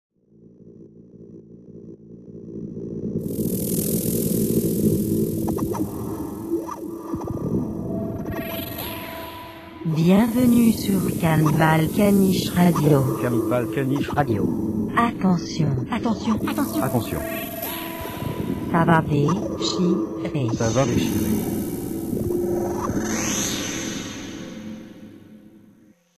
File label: jingle